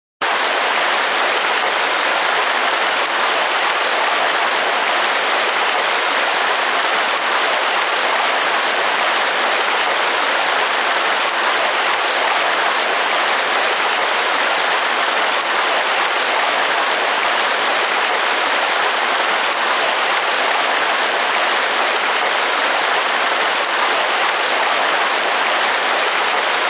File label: Mountain River High, Constant rushing sound of a mountain river; Mountain River High Pitch; Rivers (Sound Effects:Nature)